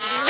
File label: whizz; weapons; source engine; dod